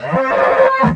dewback vocalize

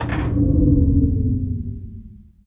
engine power decrease

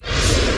force generic start

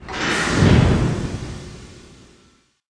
ship dock release

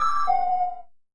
ui quest destroyed wave

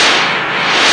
force throw

